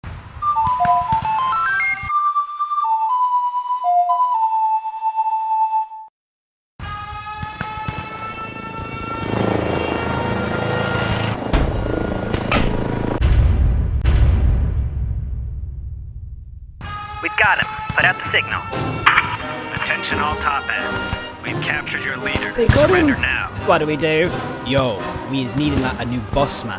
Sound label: Ocarina